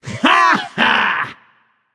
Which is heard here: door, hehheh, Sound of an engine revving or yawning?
hehheh